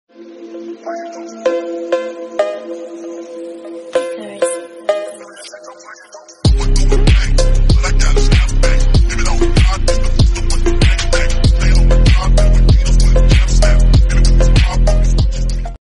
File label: classy background music tone ringtone; galaxy ringtones